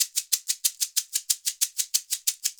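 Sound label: shaker, percussion; ILIO; Synclavier Percussion 2; World & Orchestral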